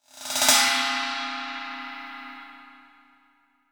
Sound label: ride